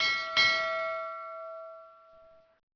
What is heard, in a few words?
Ring Bell